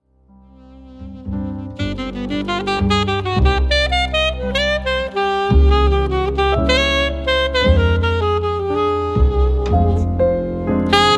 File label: Alto Ballad